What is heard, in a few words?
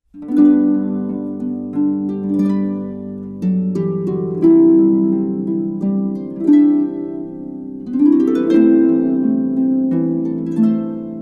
strings ensemble